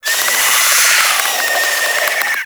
Infested Face Hugger Charge; Warframe

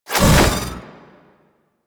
Ability Throw; Warframe; Warframes; Trapper

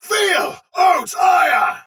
attack heavy soldier sword agent male; Dialog; Barks; Battle; Melee; Sinecure

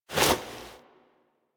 Foley Misc; Warframe; Operator

